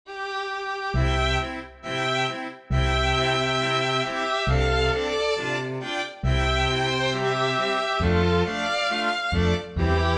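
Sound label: String Quartet 2nd movement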